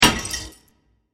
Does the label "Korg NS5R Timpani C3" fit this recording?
No